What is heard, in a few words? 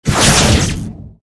skill explo